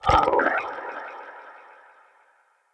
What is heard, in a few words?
Shrimp Vocal Pain, Creatures; Vocals; Baliq Shrimp Glade